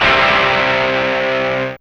Fuzz Guitar C3

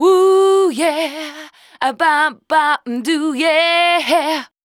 FEMALE, Dance Vocals

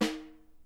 SN, Percussion; Miroslav Vitous - Percussion & Harp

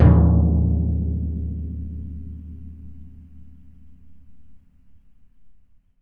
TMP, Synclavier Percussion

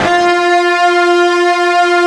FUZZ F 3, Guitar; ULT FZZ LOOP; East West; Steve Stevens Guitar Samples Collection

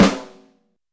Share Hard, YAMAHA RM1X

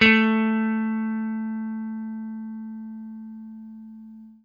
FENDER HRD, Guitar; Quantum Leap

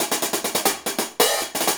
Hi Hat Pattern

